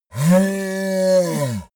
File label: yeti help call painful; kreaturen